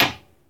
Hitting Dishwashing Table

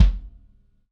Kick Low Vol

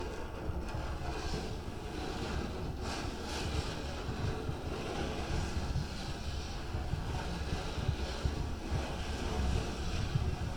Scratching Metal

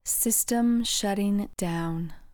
System Shutting Down

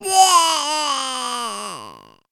Kid Disgusted Sound

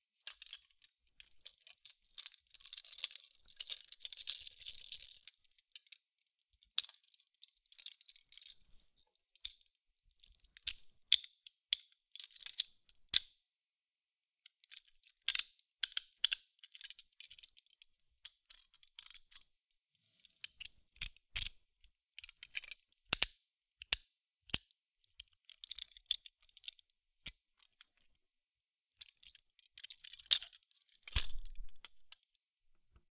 Small Handcuffs Opening And Closing

